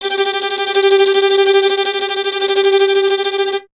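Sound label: string violin tremolo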